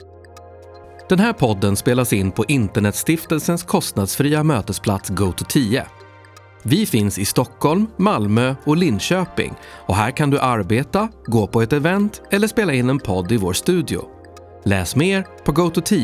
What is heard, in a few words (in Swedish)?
jingel